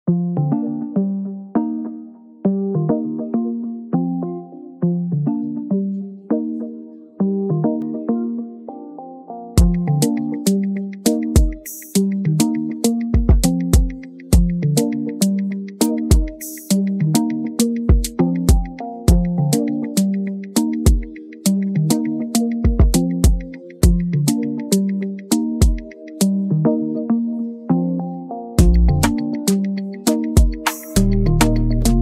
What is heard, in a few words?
instrumental beats, dancehall instrumental